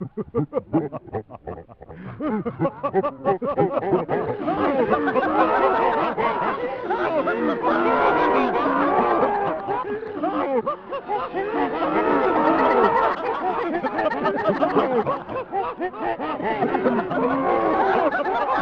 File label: Laughers; Spawn; SNES